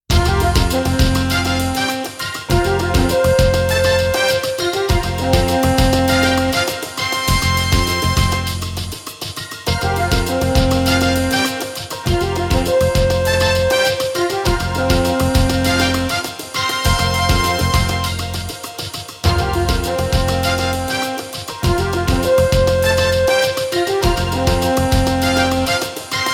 Emission jingle; Jingles medley

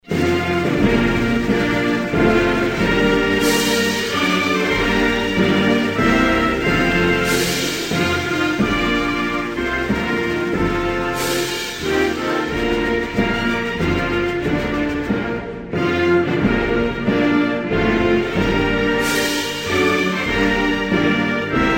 USA, Hymnes nationaux

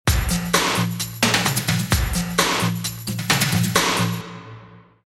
Sport Liaison; Jingles medley

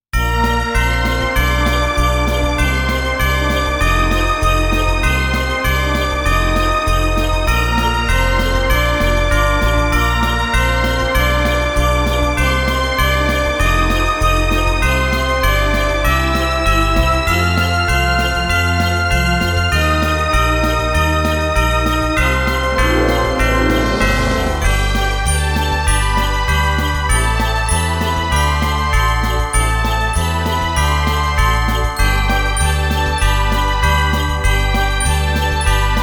Noel version longue, jingle; Jingles medley

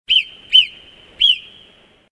marmotte

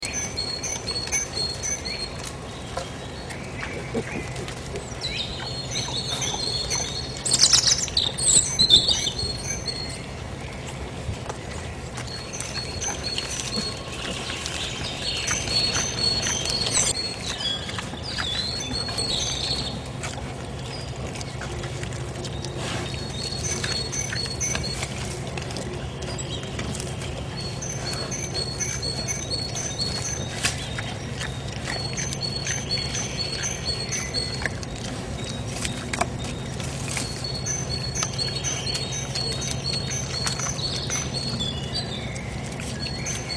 Campagne matin printemps

